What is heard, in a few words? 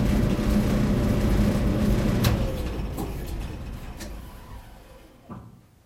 dry, washing